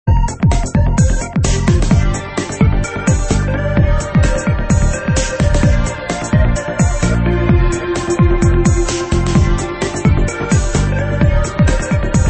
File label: instrumental